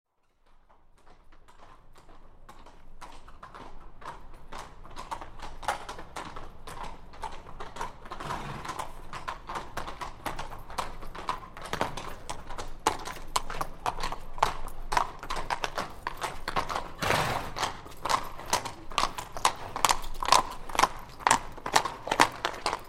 Two Horses Walk By On Cobbles; Historical; Hollywood Edge Historical Series; War Horses Armies & Weapons

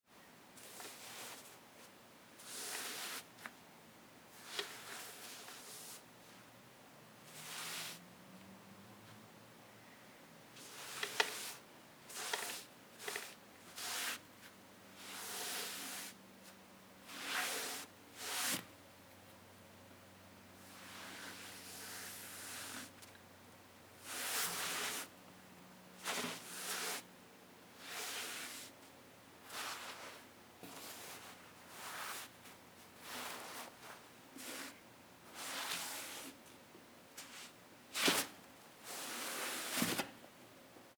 Cloth Movement Slides Pulls Rustle; Foley - Props; Foley Essentials

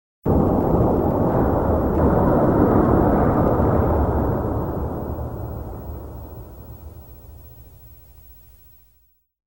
synthesizer; SOUND EFFECTS1

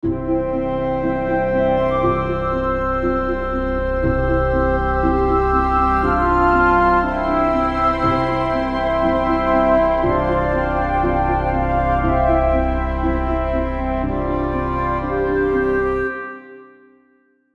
Background, podcast music

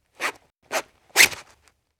Zipper Pulled Three Short Tugs; Foley; Props; Foley Essentials

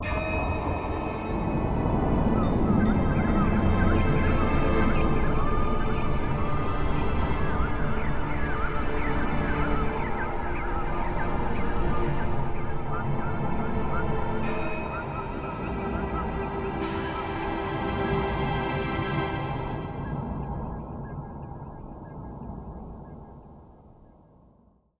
Quiet arrival, music beds

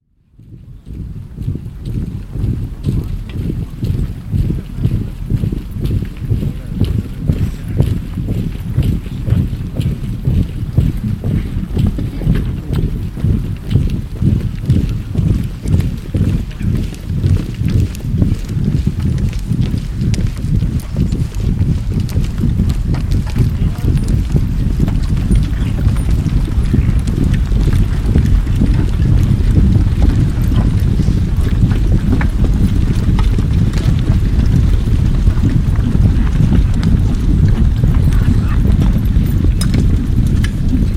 Infantry And Cavalry Pass By; Historical; Hollywood Edge Historical Series; War Horses Armies & Weapons

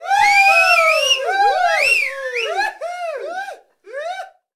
SMALL CHEER; 1000fx

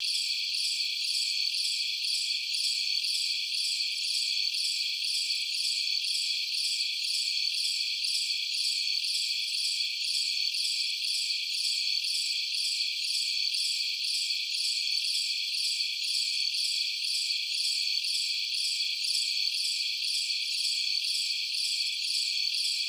Sounds of the Forest At Night; Dr. Sound Effects; 210 Nature Sounds - 20 Hours of Relaxing Natural Ambiences for Meditation and Sleep

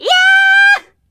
scream; 1000fx; SCREAMING

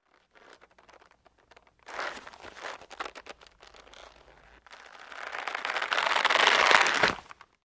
Creak Thick Rope Stretch or Twist; Doors, Squeaks

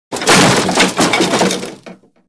Wood Crash Large; Crashes Impacts

